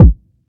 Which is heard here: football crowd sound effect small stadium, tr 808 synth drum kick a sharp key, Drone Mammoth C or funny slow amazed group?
tr 808 synth drum kick a sharp key